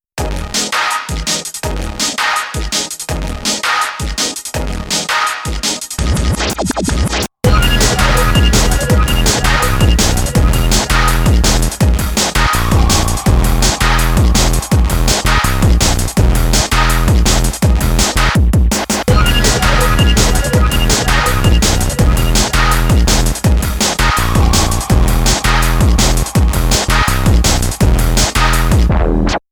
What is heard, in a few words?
techno